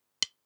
Snares & Claps, One Shots

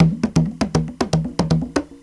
CONGA 119

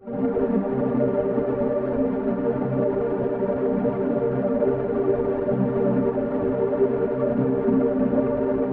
Fragments Cm 110bpm, Ambient Textures; Touch Loops; Ambient Textures - Mini Pack

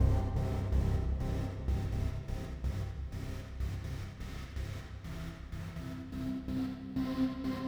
FX Industrial 125

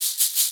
ETHNO EXTRA 90; ethno2

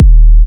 Minimal Kick, Drums, Kicks

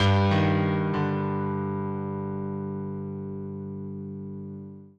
PIANO 96 G; OLDSCHOOL; Keys

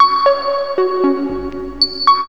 DROPS; JUST FOR FX

